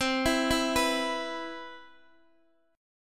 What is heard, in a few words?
C Major 7th, C, down, Guitar, DADGAD